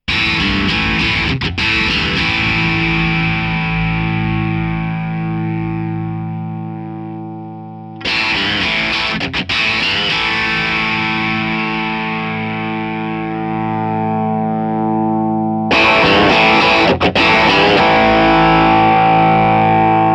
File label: bluguitar amp1